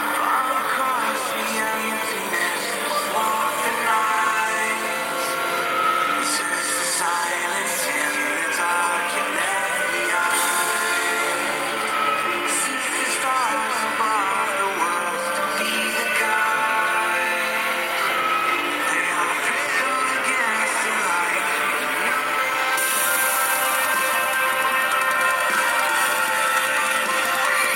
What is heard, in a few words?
satellite trap ringtone